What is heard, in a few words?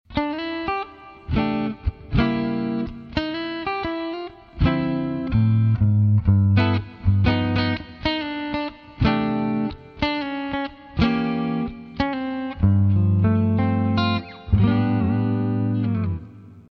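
jazz theme, guitar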